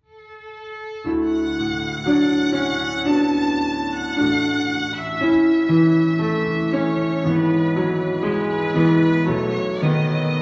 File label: Violin reverb long ambience; Abide With Me